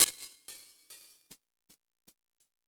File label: High Hat, E-MU Pro-Cussion